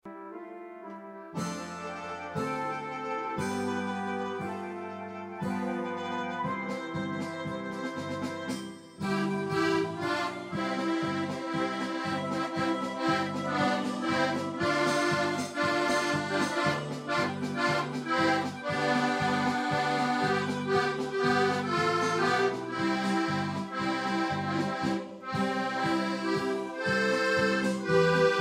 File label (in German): Keyboard; Im grünen Wald, dort wo die Drossel